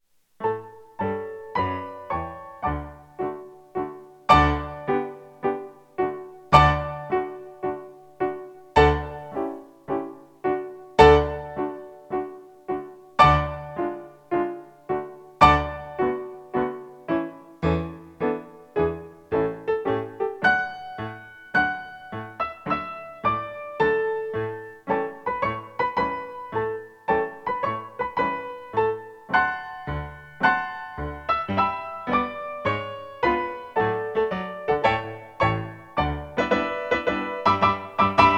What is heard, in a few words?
Piano ACCOMP; Cat like Tread Poor Wandering One